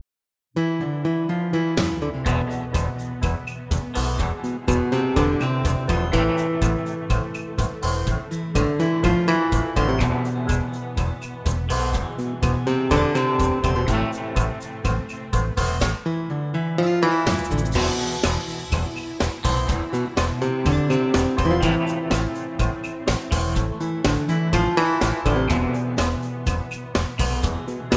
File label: Tango 124 bpm